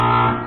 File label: wrong